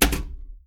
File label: SLINGSHOT; baldis basics